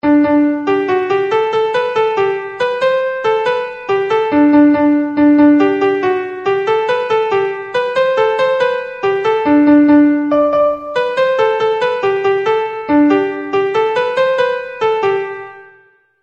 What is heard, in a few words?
tune